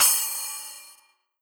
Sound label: splash, virtual drum sounds